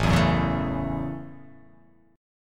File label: A 6th, guitar